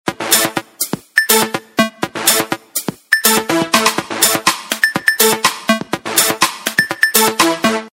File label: Beat maker; Samsung; GT-S5530